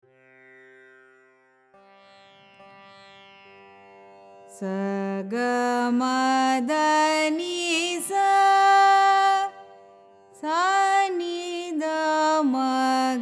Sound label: raga surya arohanam avarohanam; carnatic music